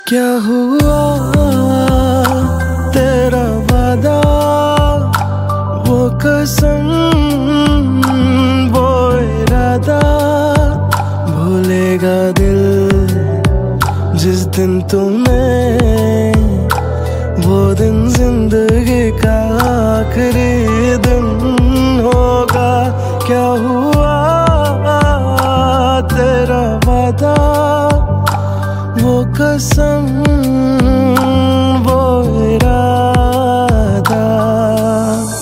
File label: Sad Song Ringtone